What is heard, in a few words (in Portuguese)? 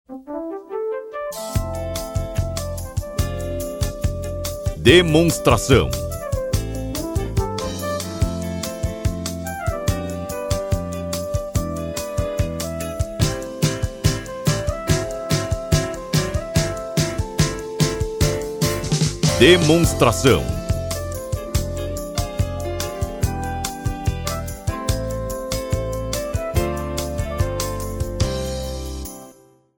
Trilha